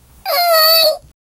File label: baby animal cute sound; adorable